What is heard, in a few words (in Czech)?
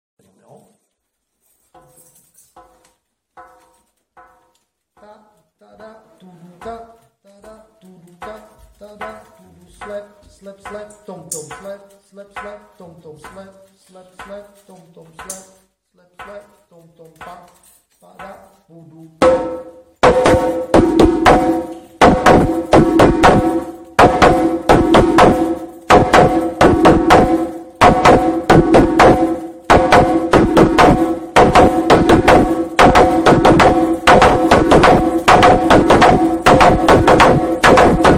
Bubny, djembe